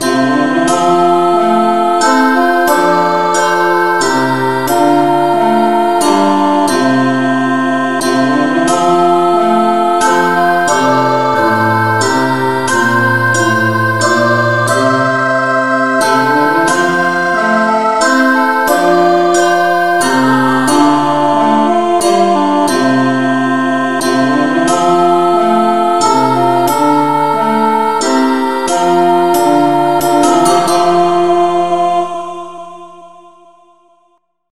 no vocal key F

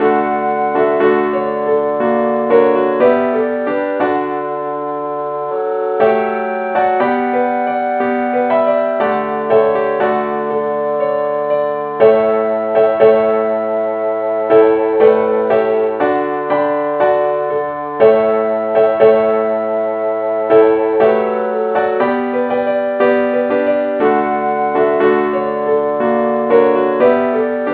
keyboard key g

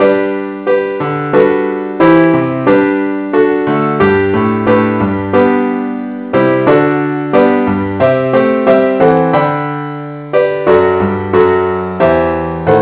keyboard only key g; christe Sanctorum

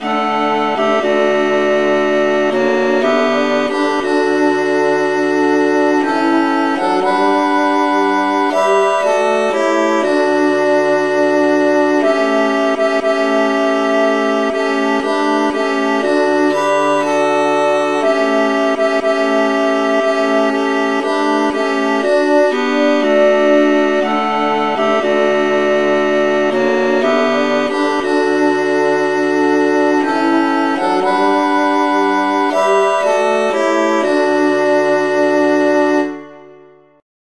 traditional key G

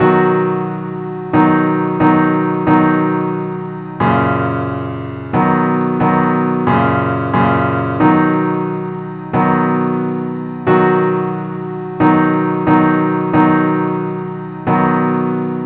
simple acc key b

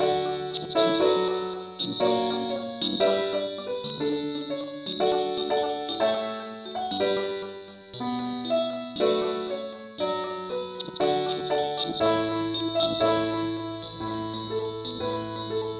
ukelele

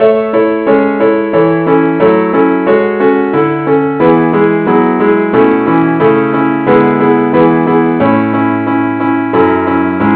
keyboard only, key a

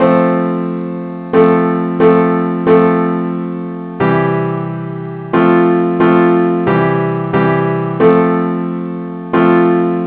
simple acc key F

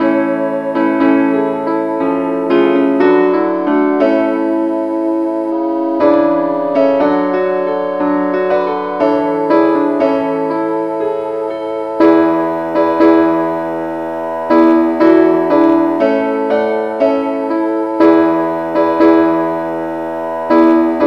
keyboard key D

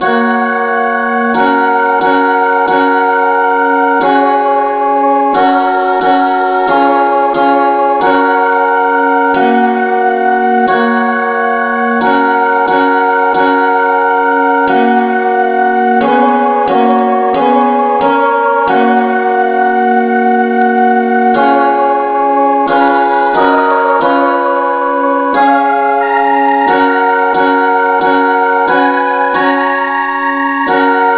novocal

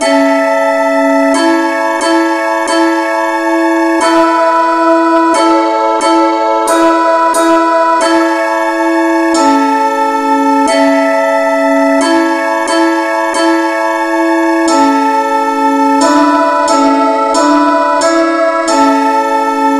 no vocal, key A

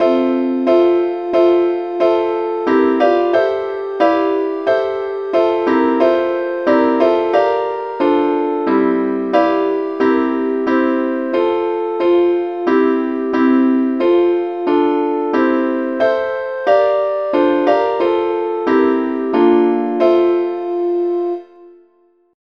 advanced lead, key F; SAINTTHOMAS

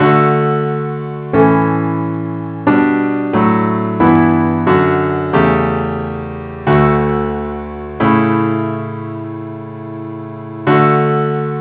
parts only key d; Gwalchmai; Glory be to God on high

